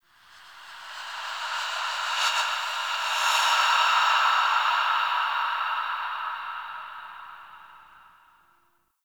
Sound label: Breaths, ambience; Warframe; TheNewWar; Zariman